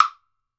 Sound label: BLOCK, Brazil Drum Perc; Drums&Percussion; E-MU Producer Series Vol. 7 – Old World Instruments